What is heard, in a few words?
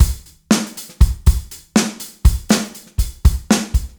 FUNK, Drum Loops, 120